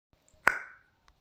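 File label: bottle neck opening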